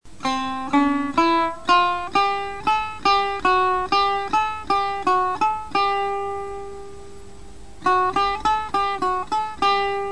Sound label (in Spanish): chahargah hesar escala, Musica persa